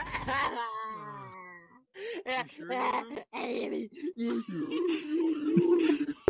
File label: screaming